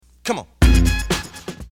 vocals ('come on')

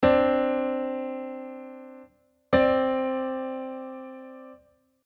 maj 2nd and 9th intervals

